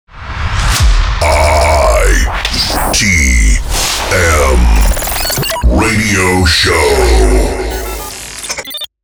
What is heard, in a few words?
jingle radio show; Clubs; Jingles